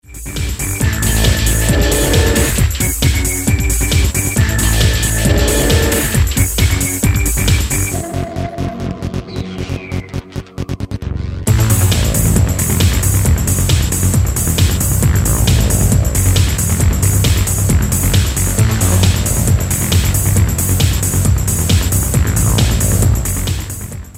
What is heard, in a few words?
distort